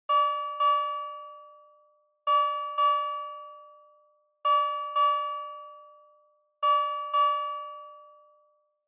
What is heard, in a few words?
Fire Truck Siren Close